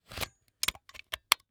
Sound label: Industry Tool Power Drill Battery Put In Drill